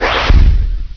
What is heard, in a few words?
ATTACK; QUAKE 1; SHALRATH